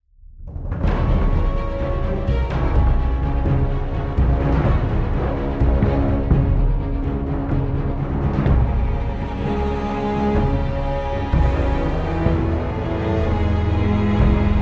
Fight Resolve; Game of Thrones: A Telltale Games Series; Linear Fight Resolve

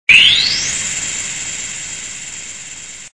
Spindash

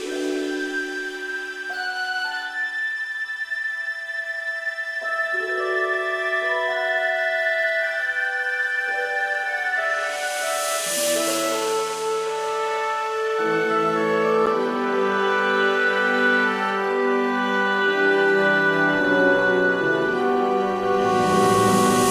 Ominous Stinger; Back to the Future: The Game; Android; PS3; Wii; Windows; Xbox 360

